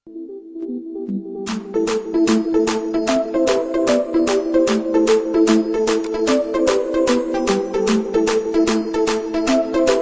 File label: Trance